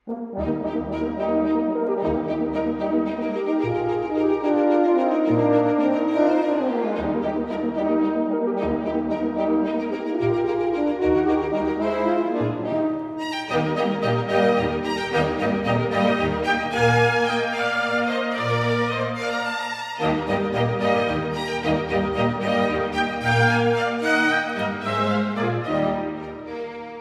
Concerto for Two Horns in Eb Major, Allegro, Tempo di minuet